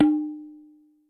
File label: Tabla, Percussion, Yamaha DTXpress